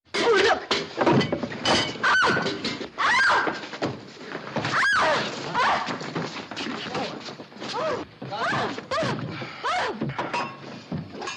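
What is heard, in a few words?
sounds of people fighting and a punch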